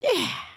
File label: breakdance eeeyah; emotes